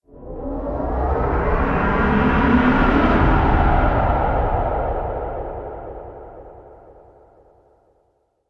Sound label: Trailer Motion Picture